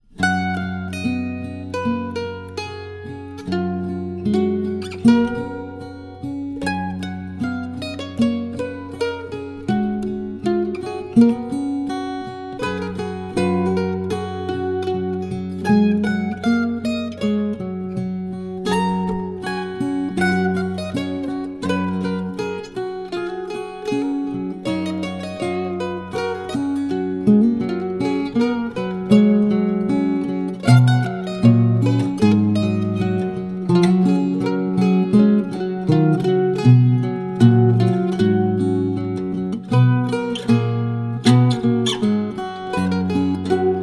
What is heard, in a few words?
instrumental; near London Bridge; motu Analog